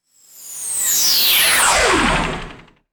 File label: science fiction laser beam pass by; lasers